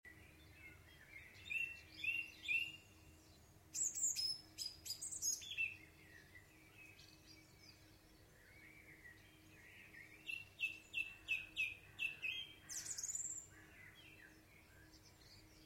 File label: song thrush